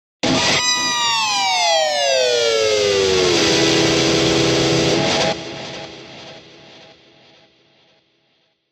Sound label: Electric Guitar Scream